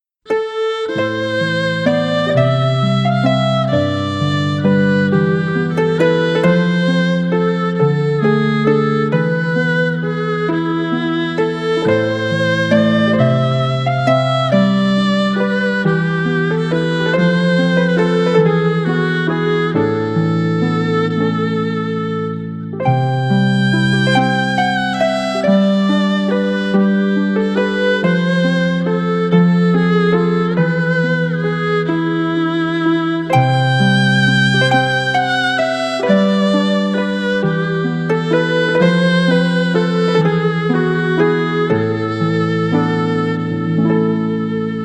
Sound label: English Folk Music